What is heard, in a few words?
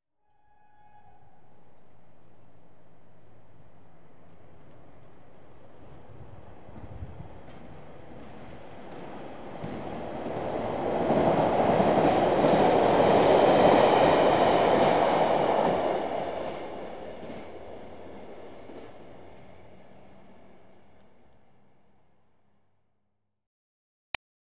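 Trains, Midland Electric, Exterior; Transportation; BBC Sound Effects Library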